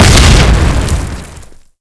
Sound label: explode; firearms